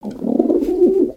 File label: snore